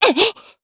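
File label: Vox Attack, Japanese; voices; heroes; Lorraine; kingsraid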